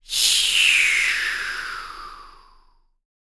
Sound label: SHEW, BREATH FX, Jazz FX; Spectrasonics; Vocal Planet